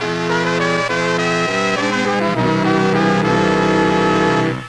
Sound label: cadence